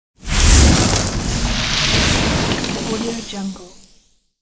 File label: elemental incineration; soundmorph